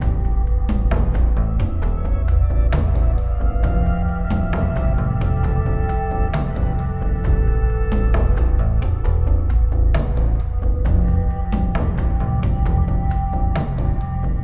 sounds music; Halo Combat Evolved; Xbox

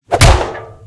Punch

